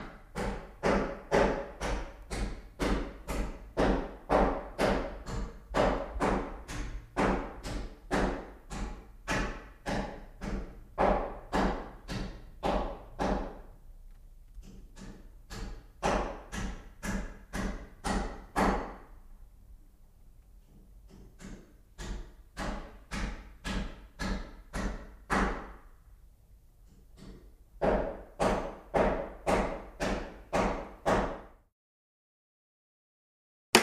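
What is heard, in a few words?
Hammering Nails Interior; hammers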